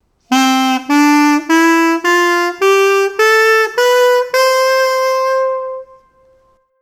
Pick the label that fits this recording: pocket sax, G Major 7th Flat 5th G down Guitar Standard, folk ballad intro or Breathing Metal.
pocket sax